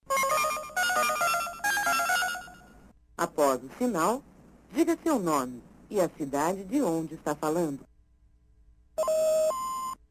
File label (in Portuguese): toque de celular chamada a cobrar